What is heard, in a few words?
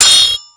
SW HIT, MORESWORD; Total Control - Action Cutz